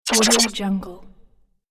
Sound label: some rapid laser pulses